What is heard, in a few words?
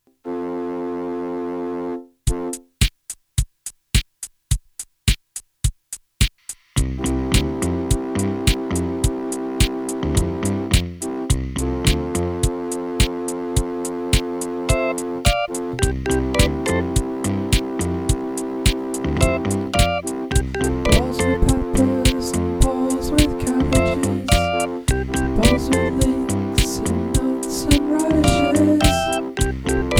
bowls bowls bowls